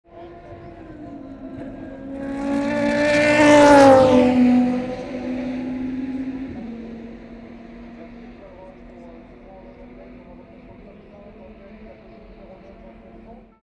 Porsche RS Spyder in Tertre Rouge Saturday afternoon